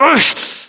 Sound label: pain, voiceovers; english